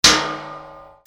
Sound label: HOUSE